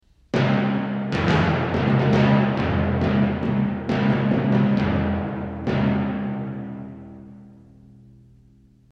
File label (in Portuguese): timbales